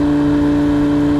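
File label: engine exhale, advanced engine sounds; engine2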